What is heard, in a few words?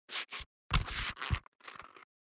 grabbing